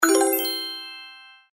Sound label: correct Answer